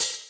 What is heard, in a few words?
Open Hhat, Hihat; Equipped Music; Loungin House